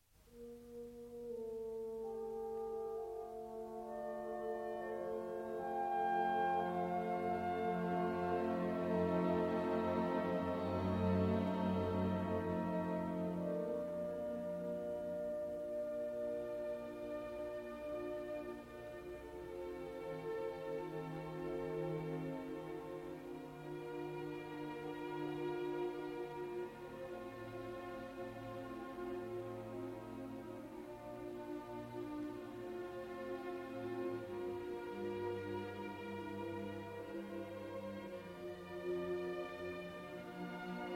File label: Symphony No. 9 in D Minor, Choral, Adagio Molto; Andre Cluytens; Beethoven; Op. 125